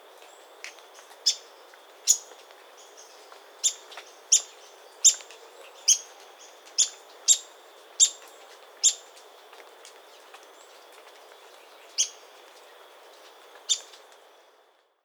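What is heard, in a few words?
Montane foliage gleaner, bird; برگچین کوهی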